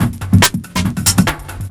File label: FILTER, Big Beat Drumloops